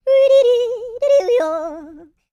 YODEL; ILIO; Vocal Planet

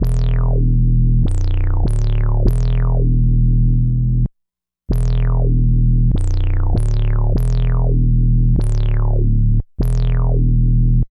BASS LP

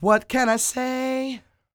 croon, Vocal Planet Blues Soul; Spectrasonics

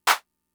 Clap; Fricke MFB-522; CLAPS

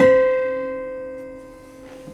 Prepared Piano; Battery 3 Sample Kits

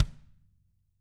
TAM Double Platinum Drums; ILIO; Double Platinum Drums 2; TAMBKIT

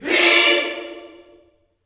PEACE CHORD, Gospel, Vocal; PEACE CHD

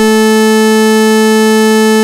OSCAR A4, synthesizer; Hollywood Edge - Giorgio Moroder Rare Synthesizer Collection

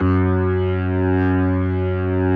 F#2S, B3, Vintage Keyboards

